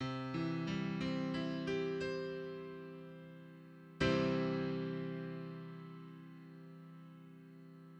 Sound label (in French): Accord do majeur arpege puis plaque